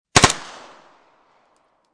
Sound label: MP5 9mm 5 Burst Barrel, Machine Gun; VRSonic; Guns